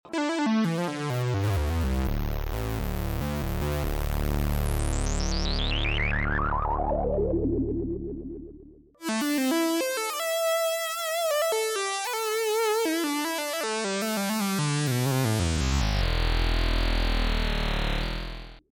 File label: sq80 filter